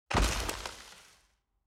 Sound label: tree Medium Impact